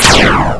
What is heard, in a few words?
Our Missile Launch; Zone Warrior